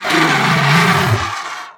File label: Hippogryph Death